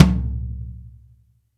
TOM; Zero-G; Chemical Beats

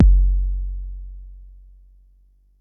Bassdrum; Jomox Xbase09

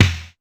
Dance Kit, Korg 01W

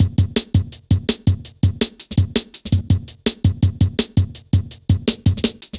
Skippy, Drum Loops, Jungle; Zero-G Jungle Warfare 1, 2 & 3; Skippy A 165.5

